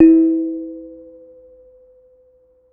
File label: Kenong, Gamelan